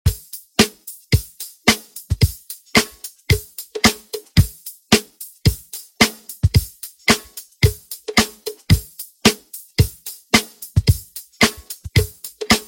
Funk 111Bpm

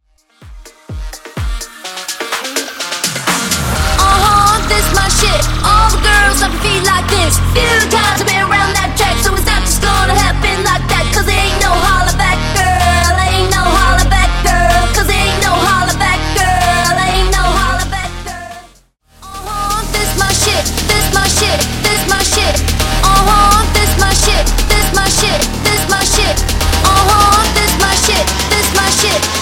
Smash Up 126BPM

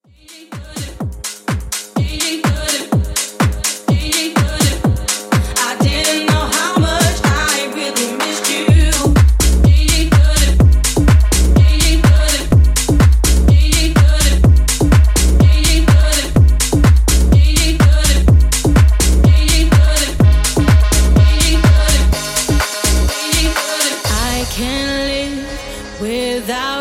House Edit 125Bpm

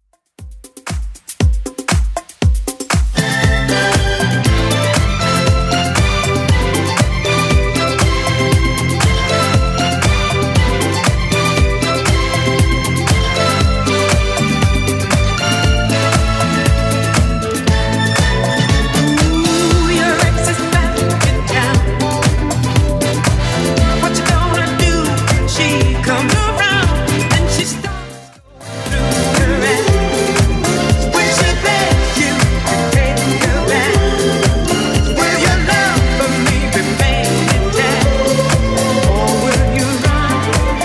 70s Club Edit 118bpm; remixplanet

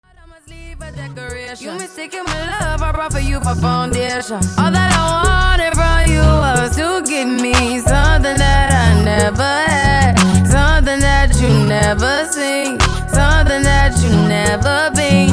Wordplay 91 105Bpm Clean; djyan

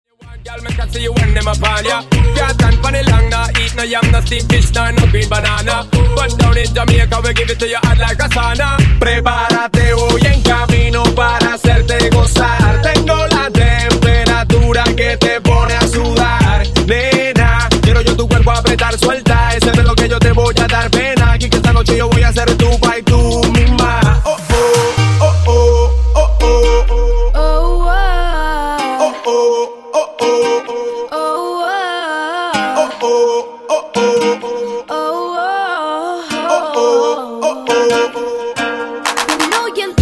Transition 126 130Bpm

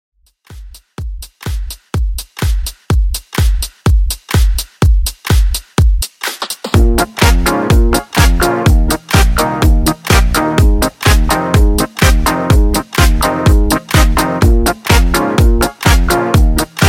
80s Disco, 125 Bpm

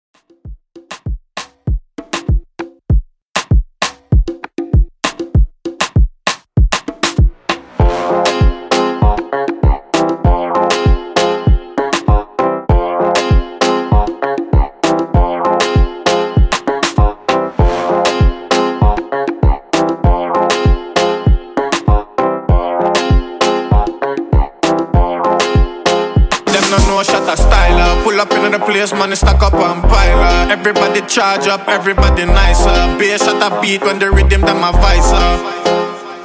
afrobeat 98 bpm

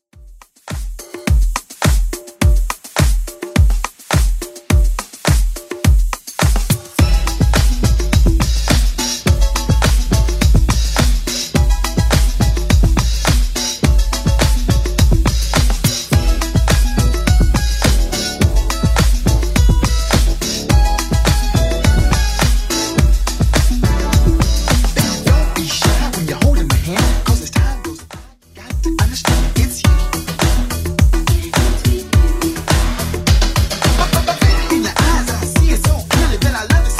80s Club Edit 105bpm

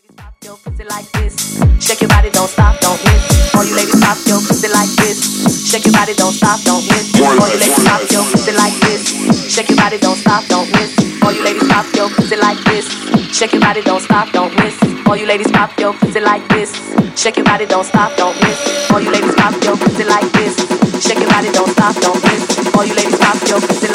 Funk Segue, 125 Bpm

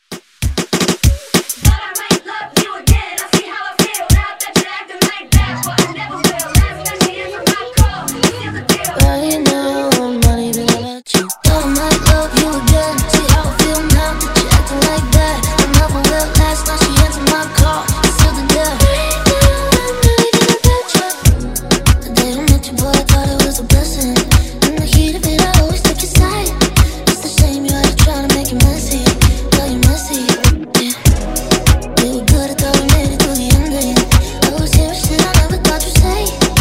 Reggaeton Redrum

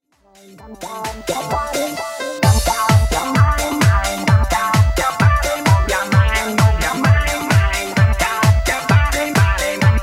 90s Redrum 130 bpm; remixplanet

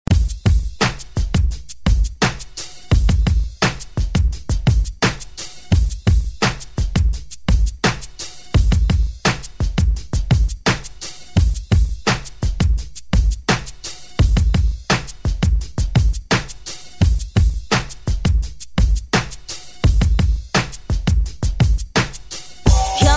Hip Hop Hook Redrum

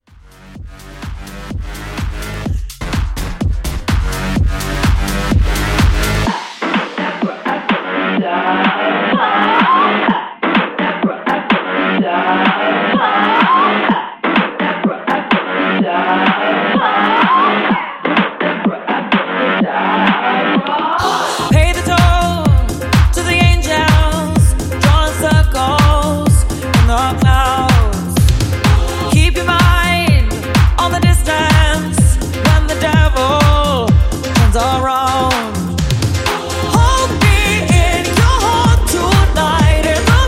Pop Redrum; Remixfreakz

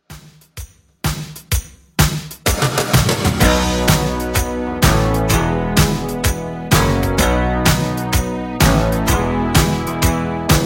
Dj Intro Outro 127Bpm

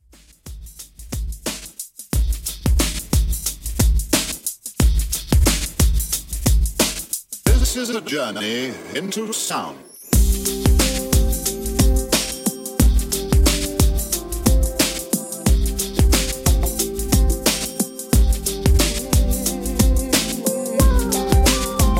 90s Classic, 90Bpm

